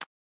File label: Catch; boomerang fu